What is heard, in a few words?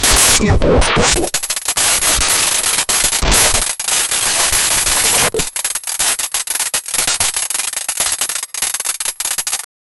breaking news television, news